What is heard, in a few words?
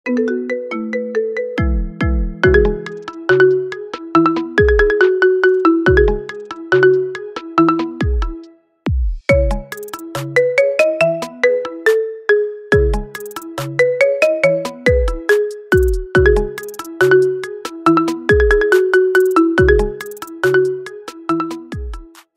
Marimba Remix